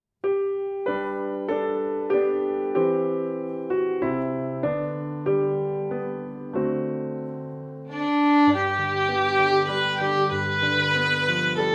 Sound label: Intermediate Violin Piano 95BPM